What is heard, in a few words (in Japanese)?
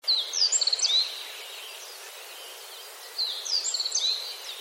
hojiro